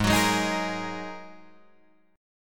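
A flat 7th Suspended 2nd A flat Guitar Drop D, chord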